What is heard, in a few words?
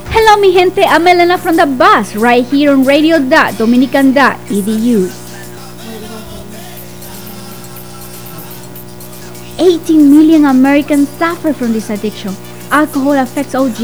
old vocals